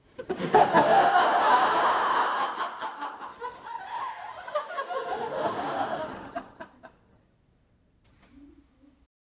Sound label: realistic audience laught, theater